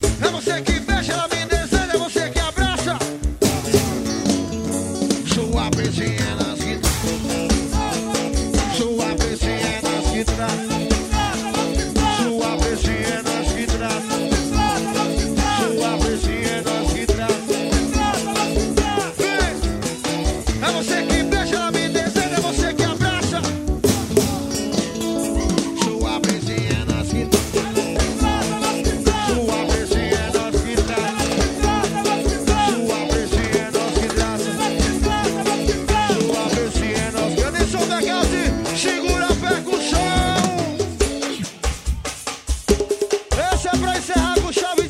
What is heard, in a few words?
swing